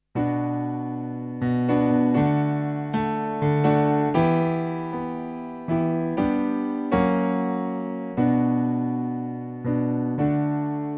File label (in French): Piano Rythmique; akai apc mini